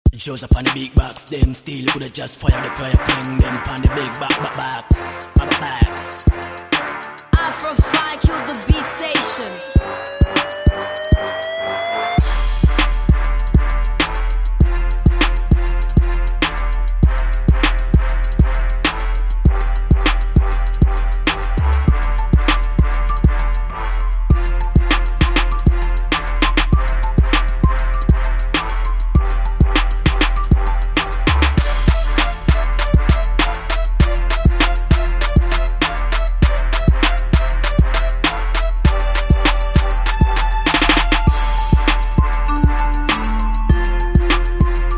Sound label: Beat